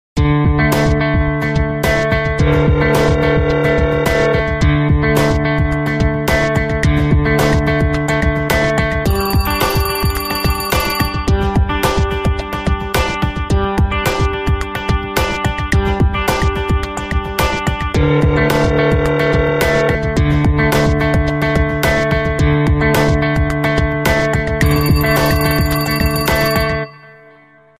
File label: Calling; LG S367; ringtone